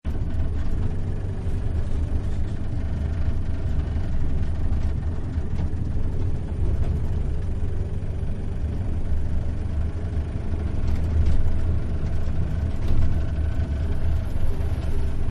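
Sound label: Tuk Tuk Interior Loop; Carriages; Vehicles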